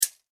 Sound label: industrial tools tape measure metal tape movement; Metal Measuring Tape Movements; Machinery; Machines; McKinney Sound